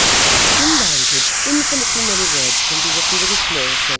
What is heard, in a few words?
white noise w speech ducking